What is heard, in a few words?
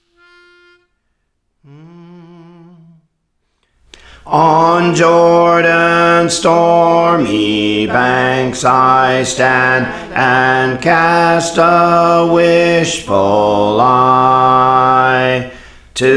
Bass, Songs; On Jordans Stormy Banks (McIntosh); Praise For The Lord Training